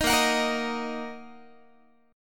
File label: A sharp Suspended 4th Sharp 5th A sharp, Guitar; 18,18,21,20,19,x; 4A,4E,5A,5D,5G#,6C#; strummed